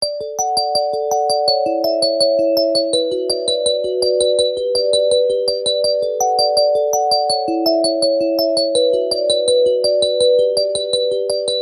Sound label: sony xperia synth